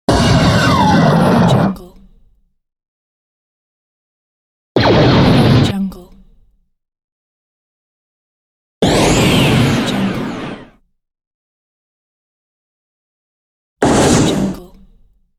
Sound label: gun shot sound effects